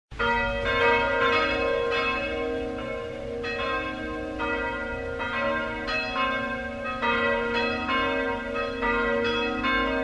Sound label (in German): glockengelaeut herz jesu kirche halle westfalen